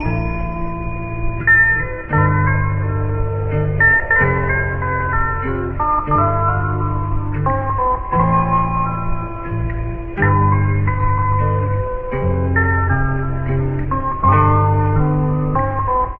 keys and guitar, Sad; Loops

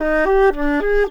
flute, Loops

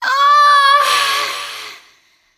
female orgasm; ooh ahh

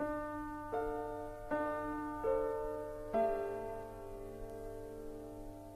piano, Loops Sad

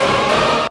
pump dat bass

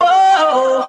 "oh" sung

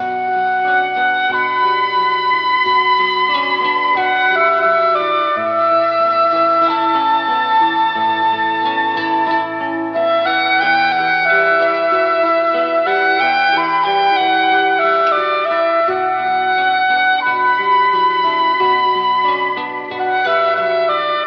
flute, Sad

